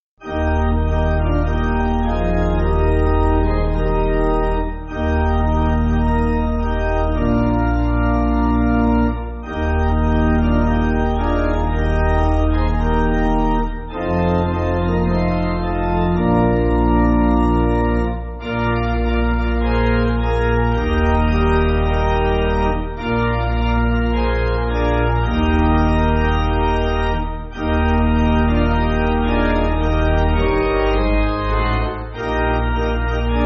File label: Jesus The True, pipe organ; I.D. Sankey; Eb